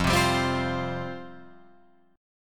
F Suspended 2nd F Guitar Open F; strummed; 5,7,7,7,8,7; JGuitar

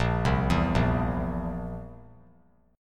A sharp Suspended 2nd Flat 5th A sharp; arpeggiated; A#sus2b5; JGuitar

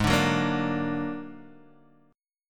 Bb Augmented 7th Ab, chord

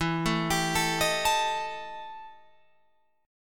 E Minor Major 7th Double Flat 5th E Guitar Open F; EmM7bb5; arpeggiated; 16,16,19,16,18,16

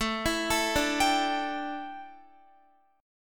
A 7th Suspended 4th Guitar, chord; A7sus4 arpeggiated

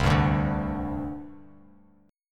C Suspended 2nd, C, down, Bass Standard; Csus2 strummed

